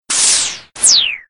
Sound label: more swoosh; StepMania 5; Dance Dance Revolution 6th mix -DDR MAX